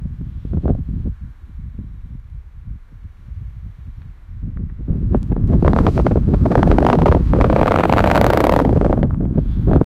riding a bike and wind

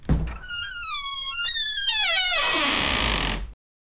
wooden door opening squea

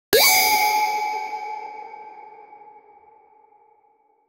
block blast game sound

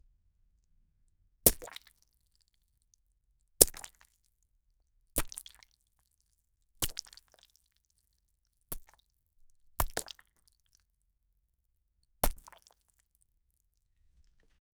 sound of slime jumping

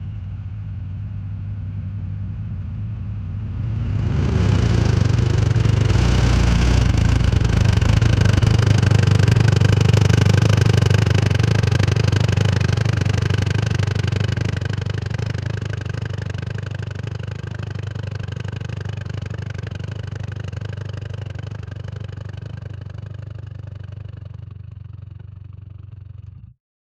cinematic foley close per, motorbikes

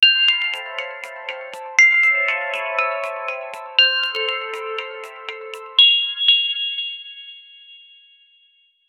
an amateurishly composed, amateur

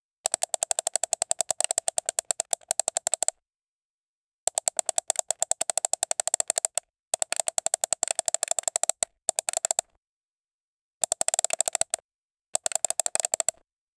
mouse click rapid sequenc

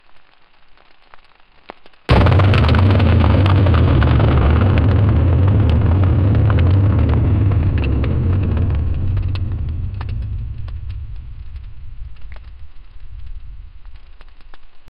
engine roar echoing rock, disasters

